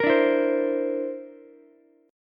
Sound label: D 7th Suspended 2nd Sharp 5th, Ukulele; strummed; JGuitar